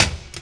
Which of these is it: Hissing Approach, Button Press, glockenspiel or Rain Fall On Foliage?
Button Press